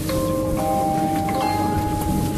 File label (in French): jingle tgv; lacroix